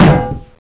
landmine drop; fallentitan

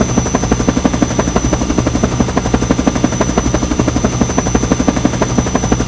heli loop

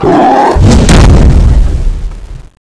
attack

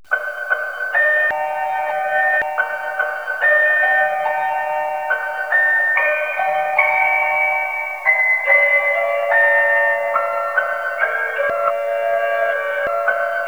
music box

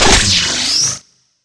beamgun idle charge, weapons

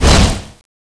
zombie ghost stab miss; ghostfury

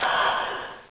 zombie ghost footstep; ghostfury

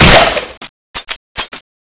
shoot, weapons; thanatos1

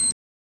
beep sound

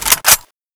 aks74u bolt pull, weapons

